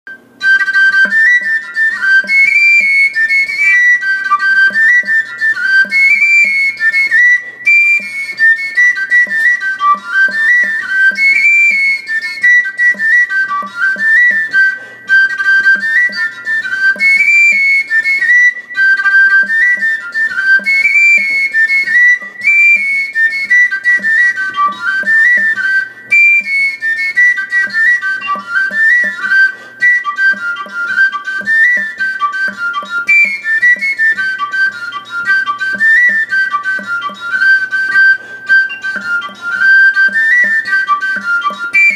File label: seagull; Willow